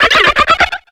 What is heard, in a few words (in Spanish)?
Grito de Buizel